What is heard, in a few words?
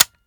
fire first; gmod; viper